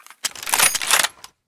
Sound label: awm misfire